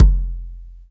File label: KICK low thud; Hip Hop; STYLE KITS (Hip Hop, Etc); UK Hard Kit